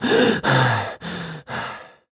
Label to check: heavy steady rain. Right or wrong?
Wrong